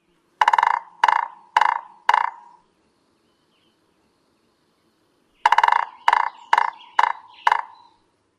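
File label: drums female, Williamson's Sapsucker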